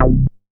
Moog; MoogFace

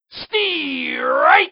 strike, baseball hit

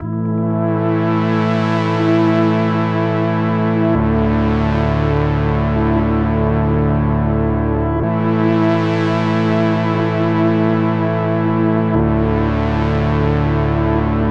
music, music Box

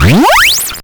ramp; PlanetDropBundle

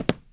FOOTSTEPS WOOD

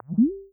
Sine Click; UISounds; gokhanbiyik

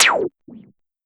animation Undo; Animation Shower

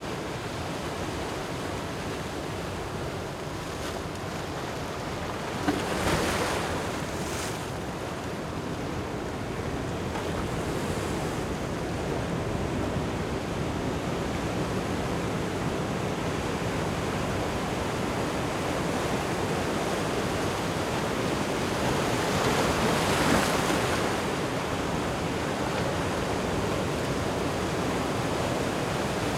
Water Waves

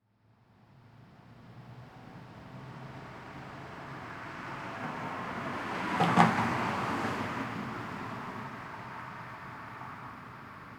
Vehicle Passby with Tire Bumps; ST450; ambiX; Vehicles

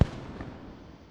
fire, fireworks; baseball

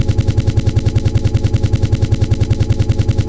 engine, swampboat

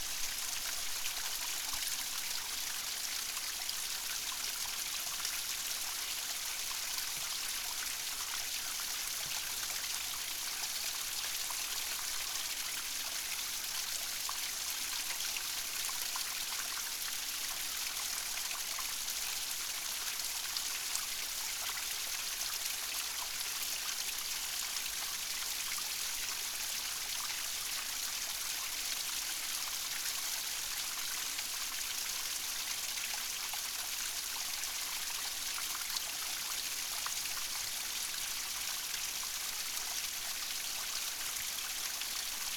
fountain; oasis

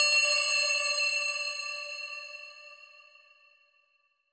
new tones

